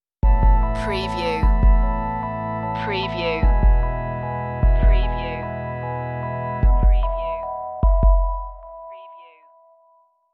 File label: rising light stinger